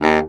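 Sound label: SAX STC D#1, Baritone Sax Staccato; Orchestral; VarazdinOrchestral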